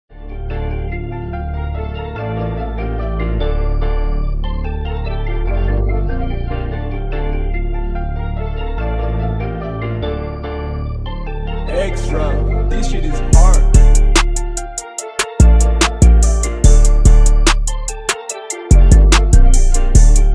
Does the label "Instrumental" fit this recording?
Yes